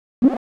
HOUSE FX 125

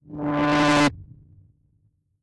Drone

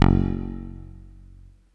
ANACLAV F#2; DirectWave; Orchestral; FL Keys - Ana Clave

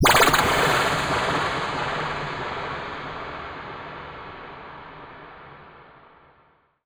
TECHNO FX 140

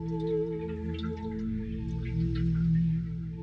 SPACE PAD 140 A; SpaceSounds

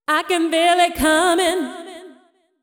deep house female, 125, A, vocals

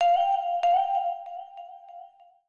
SYNTH 96 G; old school

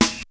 SD TONEDIRTY, snare drum; SNAREDRUMS

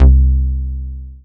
BASS MONO

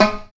reggae snare drum; SNAREDRUMS

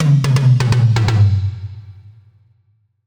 Toms

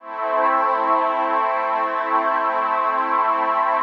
DEEPHOUSE ORGAN 125 A

